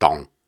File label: Syllables and Imaginary Words, Viking Vocal, Hard